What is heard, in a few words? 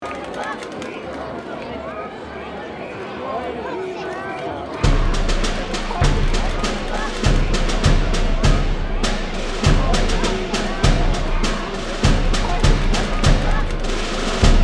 Pipe Band